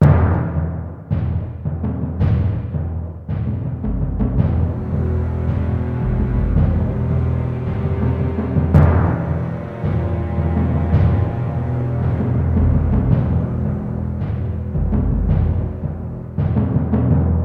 Drum

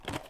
BOX CASSETTE

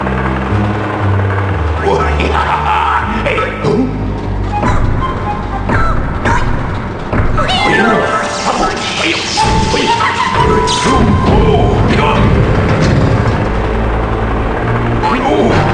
cinematic victory!; Frogger 2 original game rip

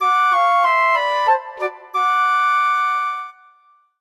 Holiday Fanfare; Fortune Street; wii

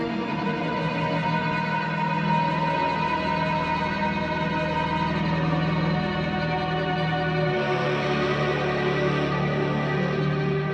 wither Gloop; minecraft story mode gamerip 2016; A Block and a Hard Place

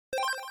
Coin (SFX); Mario 3 Around the World; Genesis

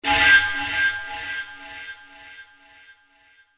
panflute

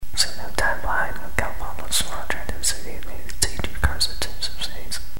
Whisper; silent hill 2

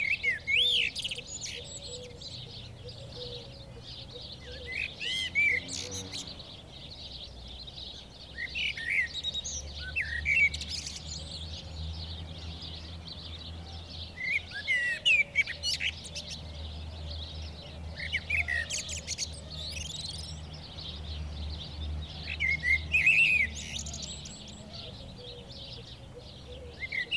Scot Vill Drive Eve; The 39 Steps

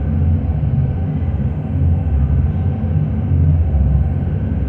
SFX loop; Disney's Dinosaur

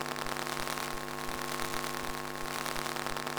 Security Camera Sounds; don t be afraid the first toy

